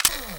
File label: scroll end; Freestyle Street Soccer; XBOX